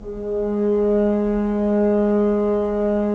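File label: Solo French Horn, Brass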